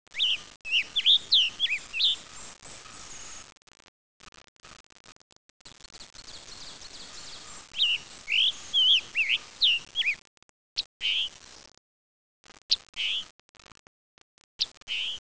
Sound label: scarlet tanager